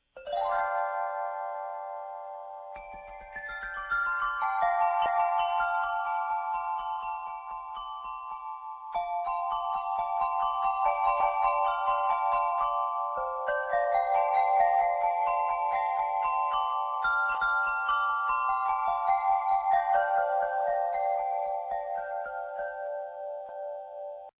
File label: pipeharp